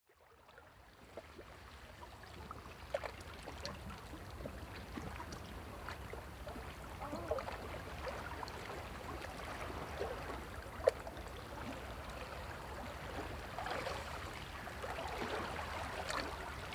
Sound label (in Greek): Προβλήτα Άνοιξη; Προβλήτα Άνοιξη (ΗΧ); Limani